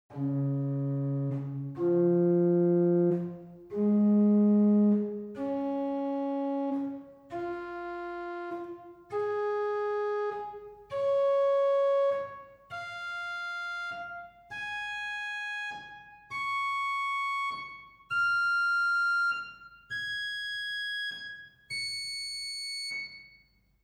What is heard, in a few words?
Octava 4 arp, organ stops; Reinhardtsgrimma; typical Silbermann middle-sized church organ